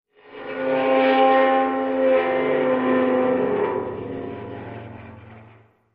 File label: Dry Ice Eerie Metal, dry ice